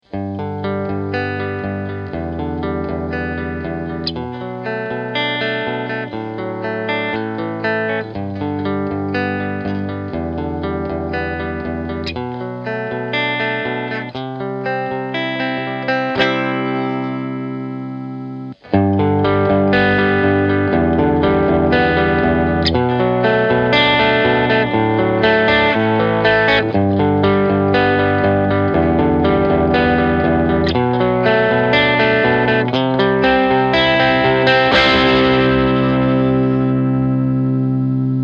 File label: hilotron